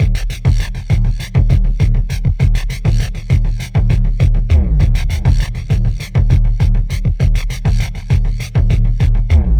Fuzz Beat, Extra Tweak Grooves

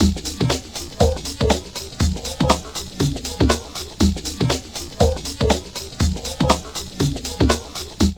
Drum Loops Crazy Processed, 120

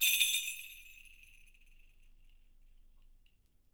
SHAKE, Percussion; Miroslav Vitous

